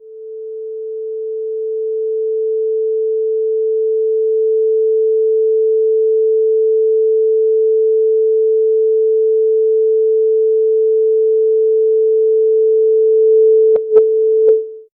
neurons transmit informat; biology

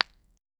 hit blood and bone, gore

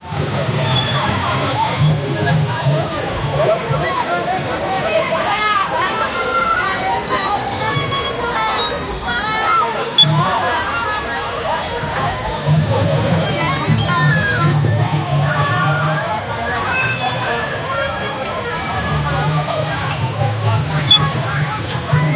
a lively indonesian amuse

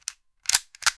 inserting a magazine into, magazine

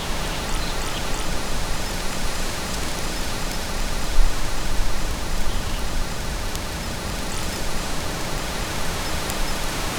realistic tree rustling i, field recording

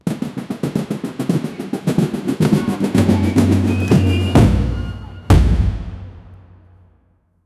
oh no a entire marching, marching band

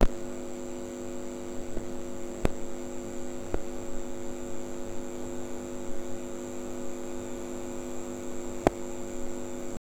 noise from the television

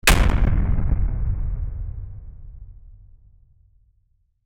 one punch on an artificia; architecture

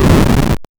8 bit retro sound of an, chest open

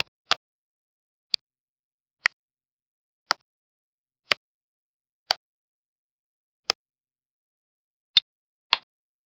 soft friendly button clic; kids

